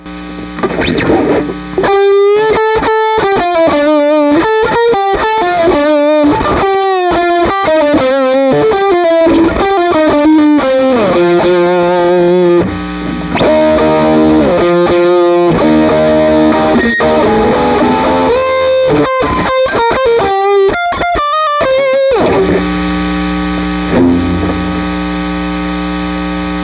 90s Pedal 10, guitar, Treble Booster; Guild 1984

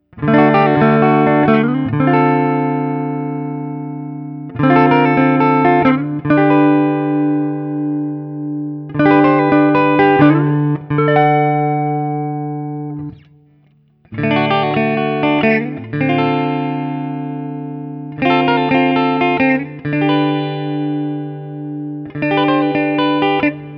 Guild NS M75 ODS100; Aristocrat; Gold; goldtop; 2014 Guild Newark St. M75 Aristocrat in Gold

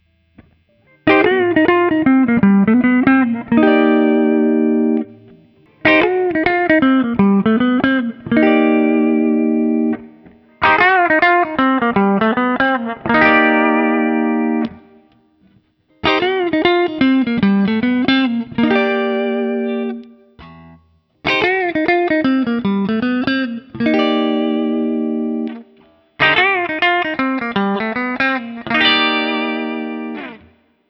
Guild X170T, End; 1999; Guild X170T Mars; ODS-100

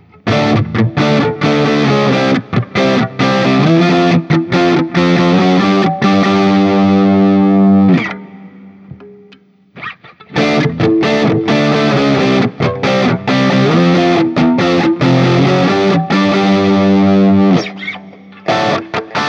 Guild S300AD JCM800, A Barre Chords; sunburst Guild S300AD guitar

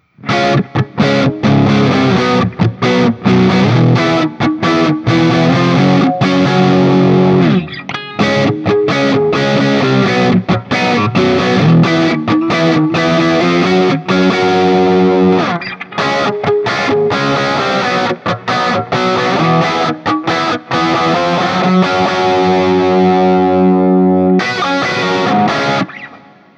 Crossroads Doubleneck 6 JCM800 A, A Shape; Guild Slash Crossroads Doubleneck

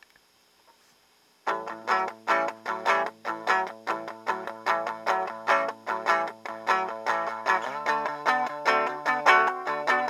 Guild Starfire 7th; 1981; amplifiers

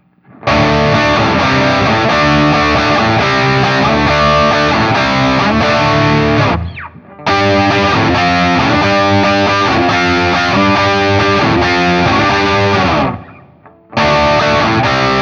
Guild M75 RV50 Riff; Aristocrat; Gold; goldtop; 2014 Guild Newark St. M75 Aristocrat in Gold

